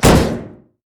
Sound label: wolfman vs metal; LoL; warwick